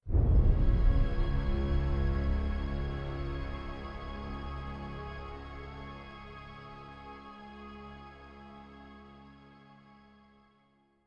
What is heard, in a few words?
Suspense; Pillars of Eternity